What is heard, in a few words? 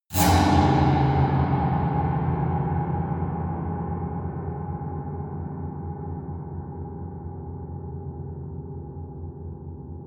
piano twang multiple fast medium